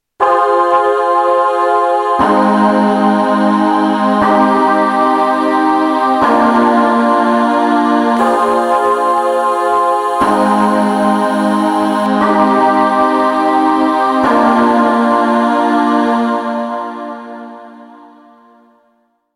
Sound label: Choir